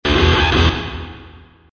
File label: rock; camp rock back to school